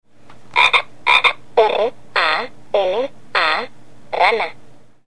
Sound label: frog; rana spelling